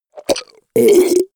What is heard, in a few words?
goblin wet burp; koerpergeraeusche; comedy-cartoon; soundpool